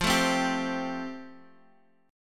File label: F Major Add 9th, guitar chord